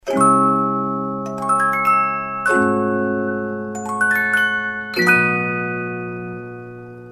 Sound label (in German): maedchenchor